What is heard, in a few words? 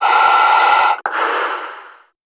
breath slowish; Shattered Horizon